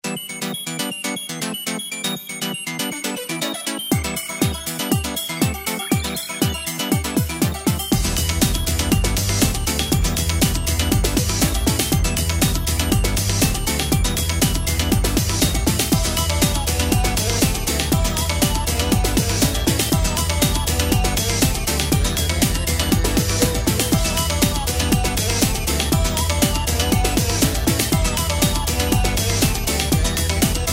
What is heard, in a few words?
techno